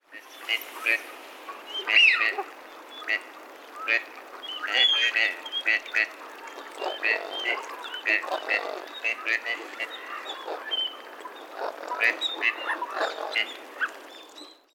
オカヨシガモ; 草加

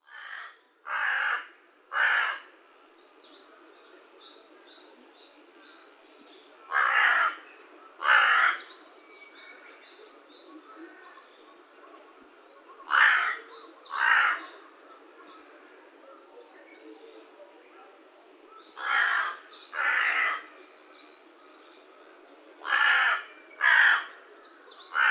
hashibosogarasu; kitamoto

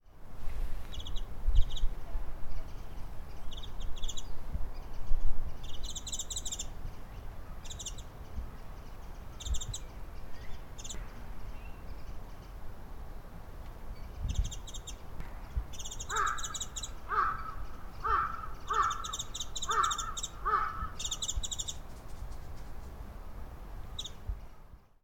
mejiro

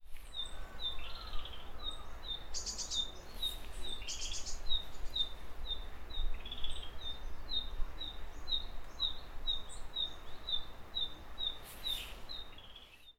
kibitaki, call

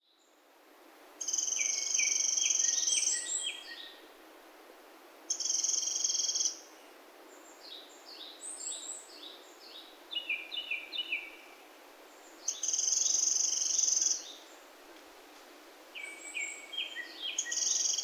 ミソサザイ 警戒; 西丹沢

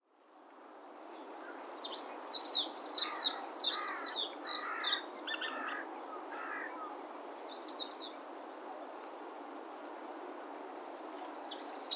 mejiro

